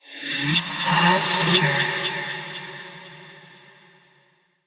Hand Compass Vocal; Warframe; Duviri

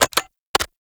Arch Gun Alt Charge Mech; Warframe; Weapons; Archwing; Hildryn

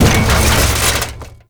Gatling Gun Debris Impact; Warframe; Tau

